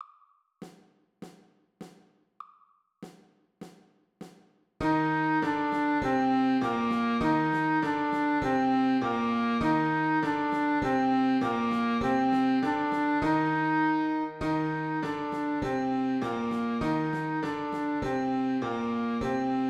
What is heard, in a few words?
March Winds